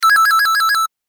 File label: Ring; Google; Android-m3-rc20a